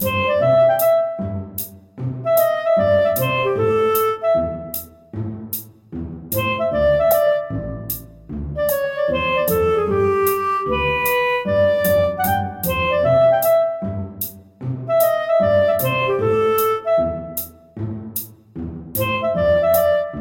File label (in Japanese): ごきげんクラリネット, 思わず歌い出したくなる嬉しい気持ちをクラリネットソロが奏でます。; ショートループ